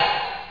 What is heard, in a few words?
Bucket; The Learning Company